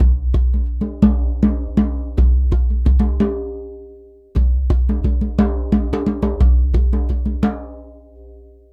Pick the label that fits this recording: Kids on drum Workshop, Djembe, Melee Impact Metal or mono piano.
Djembe